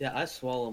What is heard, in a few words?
swallow